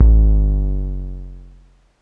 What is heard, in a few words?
BD Sub Saw, Bass Drum; Lost Tapes From Mars